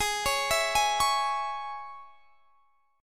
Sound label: G sharp Suspended 4th Sharp 5th G sharp; arpeggiated